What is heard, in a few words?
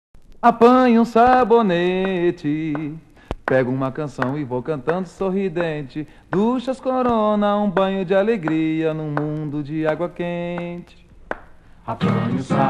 jingle; corona